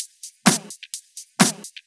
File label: Electro Loop 128 BPM; Vengeance Samples